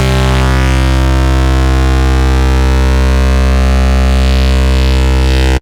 Dist Bass C1, Moog Voyager; Moog Voyager Sounds; VES1 Dist Bass

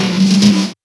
Fill 140 BPM

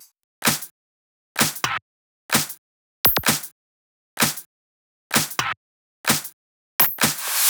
128BPM Drums; Vengeance Samples; Spring Kit

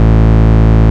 Bass Root A

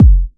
Soft Kick; Vengeance Samples

